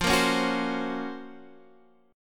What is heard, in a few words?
Gb Major Sharp 11th F, guitar